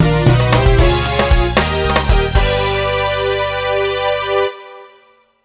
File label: Birdie; Super Monkey Ball 2; Monkey Golf 2